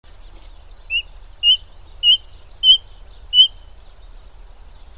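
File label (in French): elanion blanc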